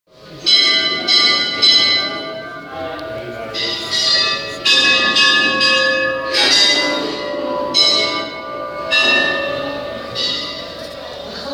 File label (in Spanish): RISHIKESH Templo